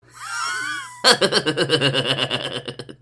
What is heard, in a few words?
LAUGH